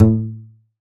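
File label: Balalaika A2 Mute; Multisamples; Digital Sound Factory Planet Earth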